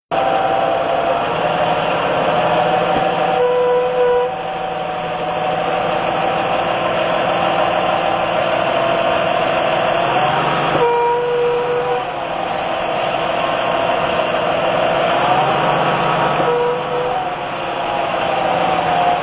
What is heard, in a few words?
brake